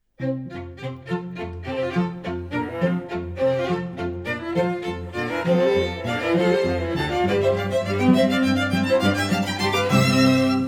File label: classical choir